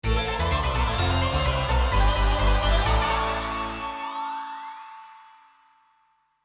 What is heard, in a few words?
Game Over; Monster Lair